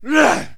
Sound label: man ugh; Mount&Blade Warband